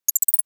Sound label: Beeps short series